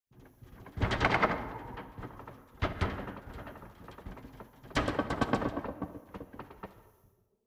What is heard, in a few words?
GHOSTS Tremor Disturbed Aura; Articulated Sounds